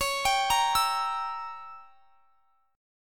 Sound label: Db Diminished 7th Db down; arpeggiated